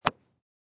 Golf Swing Iron, High quality recording of a golf swing with an iron club